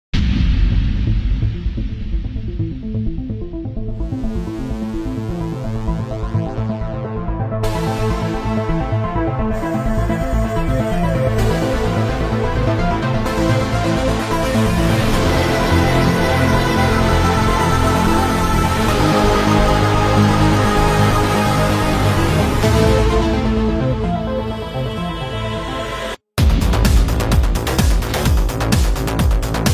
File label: Cyber rock